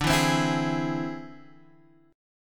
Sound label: E Augmented 7th D